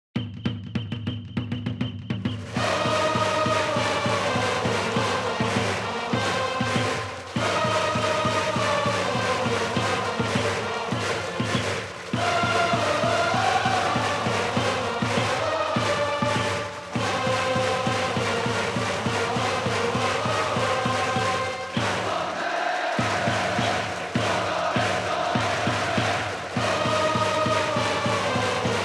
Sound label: Music Ouen Event; ebaseball powerful pro yakyuu 2020 ps4 switch gamerip 2020